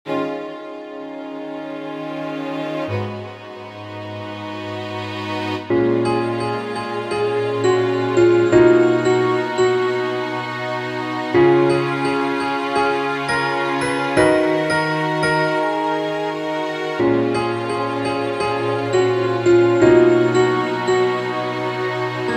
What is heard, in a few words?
orgel